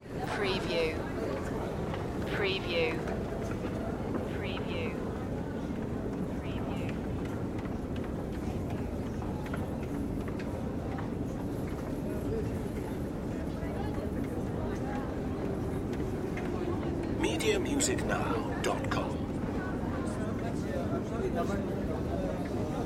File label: metro station moscow; environment; the sound pack tree